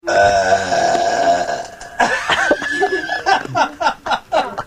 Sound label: burrrr, farts